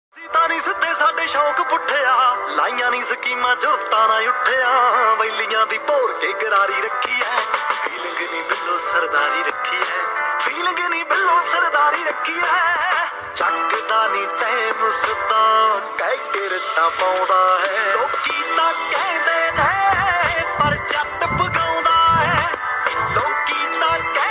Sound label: DJ Punjabi Ringtone